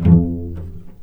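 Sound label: F1 pizzicato cello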